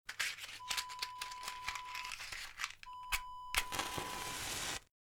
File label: match striking